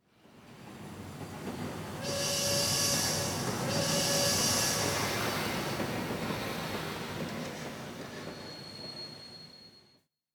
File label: Train Arriving - stereo